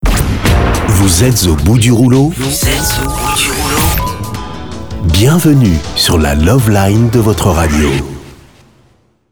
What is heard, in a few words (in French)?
jingle debut